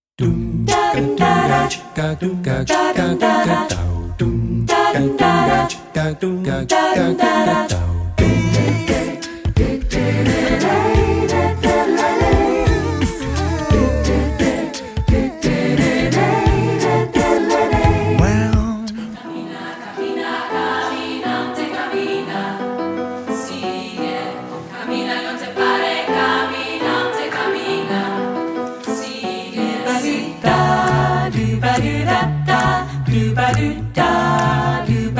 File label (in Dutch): Nieuw koor voorbeeld